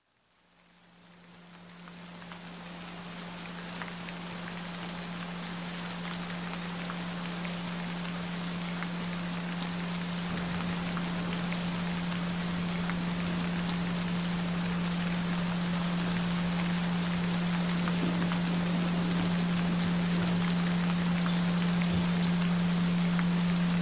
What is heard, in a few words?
Gentle Rain Sounds Frequencies; Ultimate Intelligence; Memory; Learning Skills